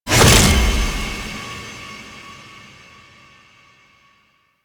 Sword Slice Hand; Cinematics; Ballas; Warframe; The New War; Throne Room; Quests

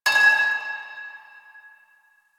Pipe Cement Drop; Ambience; Warframe; NineteenNinetyNine

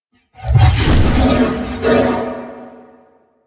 Horse Slam Start; Vehicles; Duviri

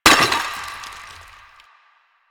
Destroy Planter Hanging; Warframe; Ambience; Nineteen Ninety Nine

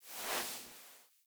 Foley Idle Stand; Enemies; Kubrow; Warframe

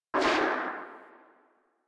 Vomit Gun Tail, Weapons, Infested; Warframe

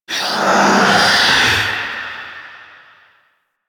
Wraith Reaper Vocal Turn; Warframe

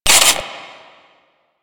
flying robot engine stop; Warframe; Veilbreaker; Quests; Ambience; Kahl

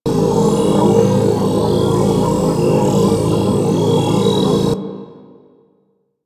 Gas Pipe Loop; Ambience; Warframe; Corpus Gas City

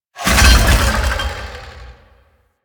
Melee Death Fall; Warframe; Necramech

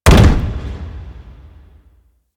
Infested Golem Step Default; Warframe

